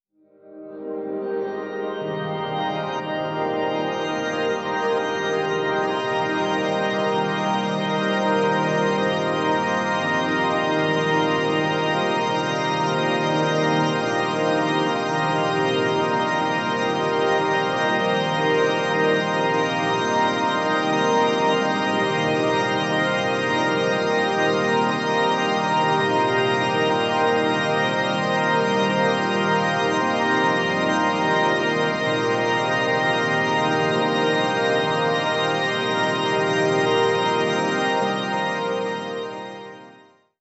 Piano Crystal; Toby; ambient or nature

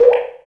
FX Dance

